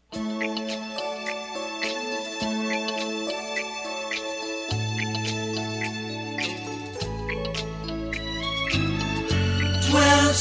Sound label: soft rock; cfrn